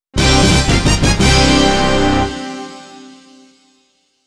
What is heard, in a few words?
ROUND CLEAR (M1 Arenji Baajon); Namco Sound Museum from X68000